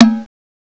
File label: CBELL ORG; KEYBOARD; CMI Sound Library Rev 1.3